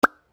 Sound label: main click